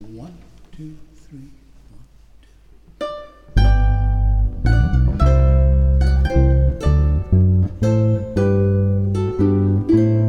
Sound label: Bass Practice File; Planxty Irwin